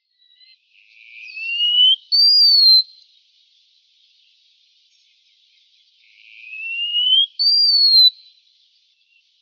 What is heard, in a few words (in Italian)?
Picchio Muraiolo Tichodroma muraria; Versi Animali; Il Forum della Fotografia Naturalistica